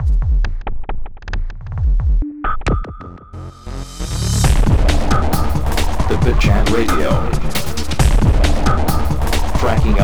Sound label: Jingle; Bitjam Innerprise